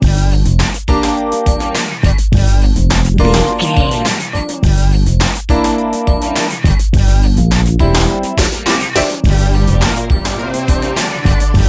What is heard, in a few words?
club anthem, Dance, EDM, Club, Eurodance, Energetic; Exercise; Aerobic; Aerobics; Spinning; Workout